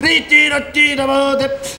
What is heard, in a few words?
VOC, Gipsy; Best Service; Ethnic Journey Sound Cube